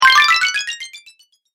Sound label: Win Bonus With Delay, video game sound effects